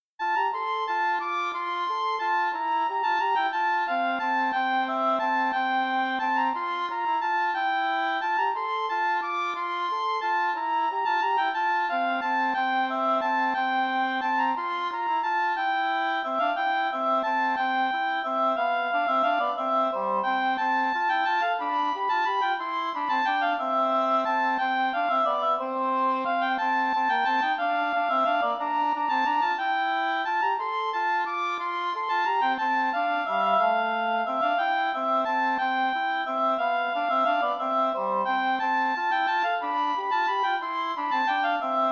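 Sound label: passepied oboe english horn duet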